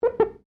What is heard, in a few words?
GLASS SQUEAK FINGER